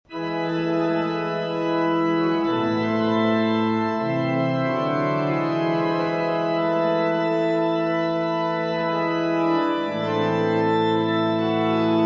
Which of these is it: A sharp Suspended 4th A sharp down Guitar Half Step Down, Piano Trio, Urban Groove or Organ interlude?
Organ interlude